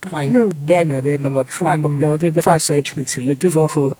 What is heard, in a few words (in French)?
un jingle radio pour lint, podcast